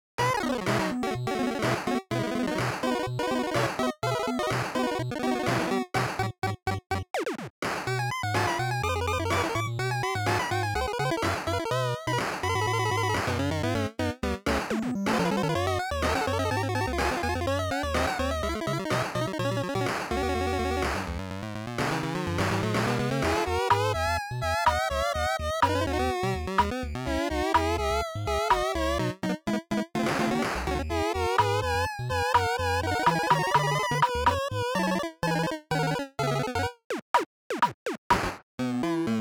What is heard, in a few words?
BGM BTL Normal; PAPER MARIO Color Splash; wiiu